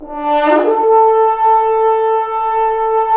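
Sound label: french horns, swells; Roland LCDP06 Brass Sections; F.Horns FX+; FHns Swoops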